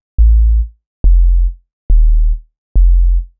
Bass Line; Eclectic Electronica

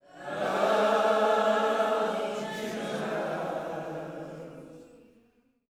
LOVE JESUS, Vocal Planet Gospel; Spectrasonics; GOSP PHR

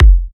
F, kicks; markus hakala kicks

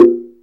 TONGUE BOX, ETHNIC DRUMS; Zero-G Ambient 1

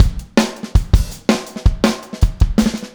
Full Mix, Drums, 162 BPM; Drums from the Big Room The Mixes; Take Your Toms Off; Verse

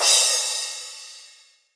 Hip-Hop Kit Crash; Roland Juno-D

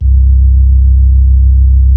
B-3

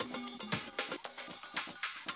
Classic Drum Loops

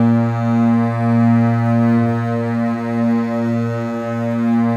A#1, Contrabasses

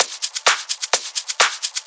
Clubhouse Loop 128 BPM

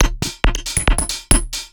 percussion loop 138, techno; PS-45

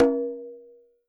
KILLER CONGA

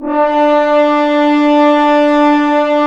BRS French Horns; Roland - LA Composer vol. 1

